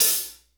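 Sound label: hi-hat half, acoustic set; drum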